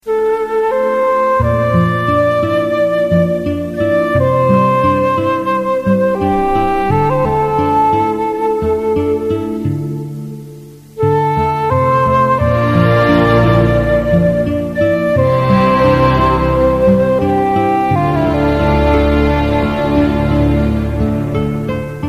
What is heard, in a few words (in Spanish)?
cumbion africano; latino